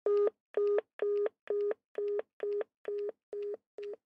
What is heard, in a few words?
Police Car Button, Police Car Button Press Volume Turn Down For Squad Car; Emergency; Emergency Vehicle